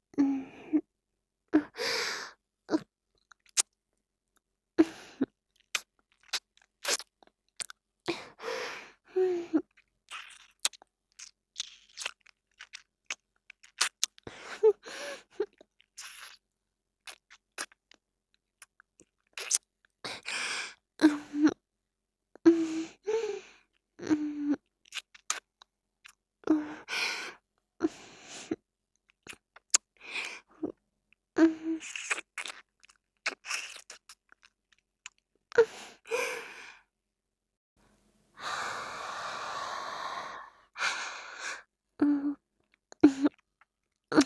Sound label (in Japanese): ちゅぱ音, ASMR; ＳＥなし